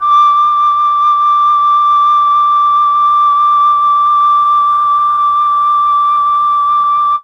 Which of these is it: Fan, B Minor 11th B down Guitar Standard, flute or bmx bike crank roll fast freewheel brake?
flute